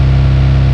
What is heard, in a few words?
engine med rpm